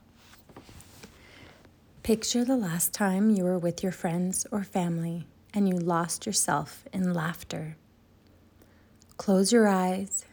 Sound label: Laughter